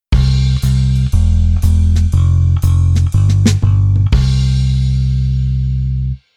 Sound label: Bass Arr Rhythm Renda